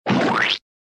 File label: splat oi